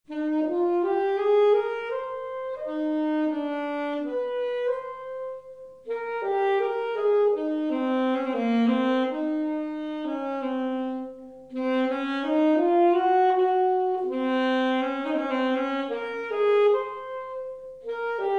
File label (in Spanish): Sonido de saxofon contralto; Instrumentos de viento; Viento-madera; saxofones